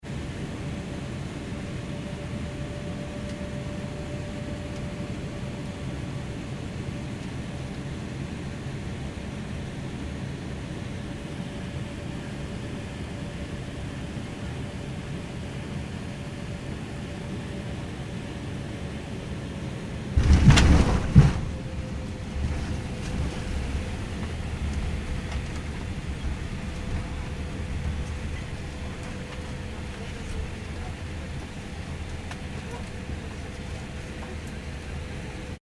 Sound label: aircraft commercial airbus a340 interior landing; Aircraft Interior Landing Ambience - Aircraft Makes Final Approach And Lands On Runway; Airplanes; Vehicles; Aircraft (Airbus A340) Internal Landing Ambience